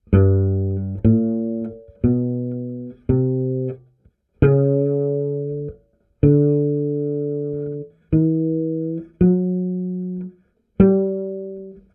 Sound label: bass play music; gamerip; The Sims 2